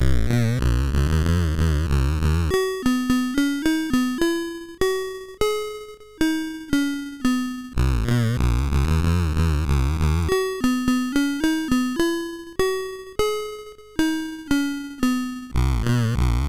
sid, MOS6581R2; Soon Coming tune 2

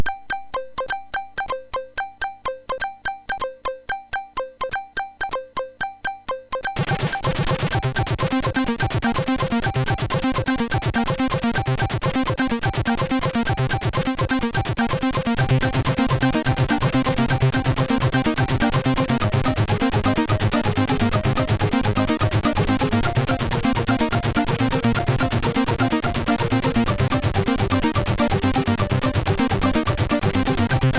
Jingle, MOS6581R3; sid

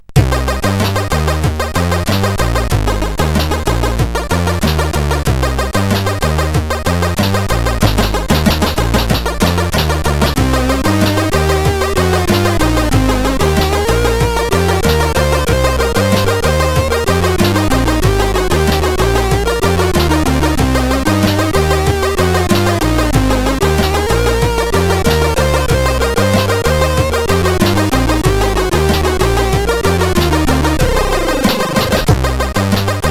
sid 8580 R5; Light Sux

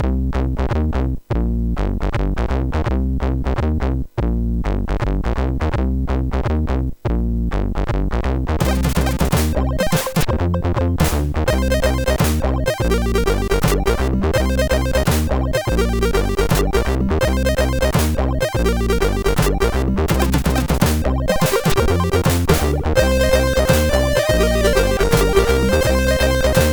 funk, MOS6581R4

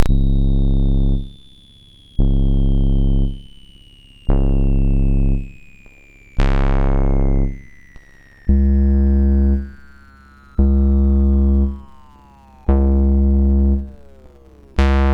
Synth Samples Bass, MOS6581R2

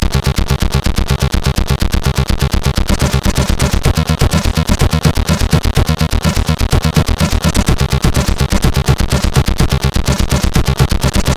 Splash Crash, CSG8580R5; sid

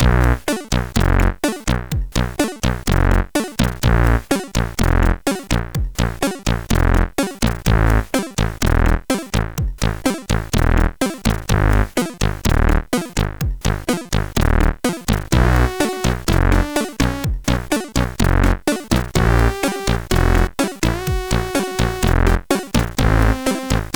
Tango, CSG8580R5

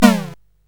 Dark Castle, CSG8580R5

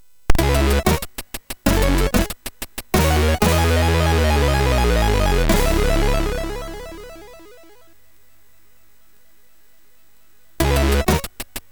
Jingles, MOS6581

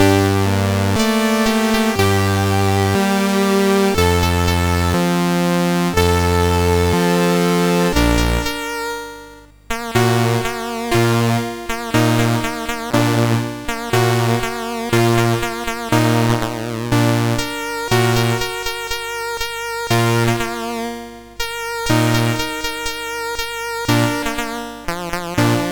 Recitative, CSG8580R5; Pirates of Penzance

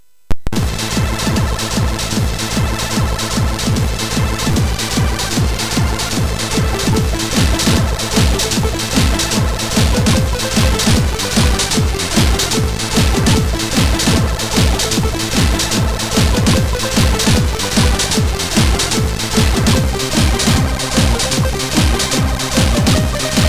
64, sid MOS6581R3; hvsc

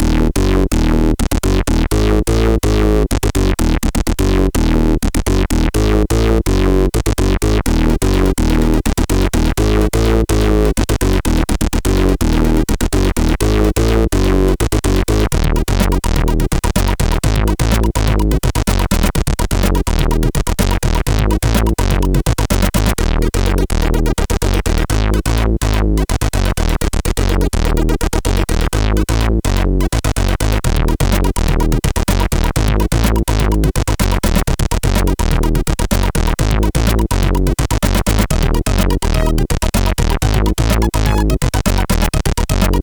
Background tune, CSG8580R5; sid

